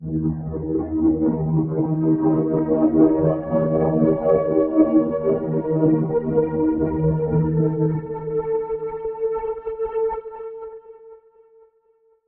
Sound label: PEN TUBE pad; eShop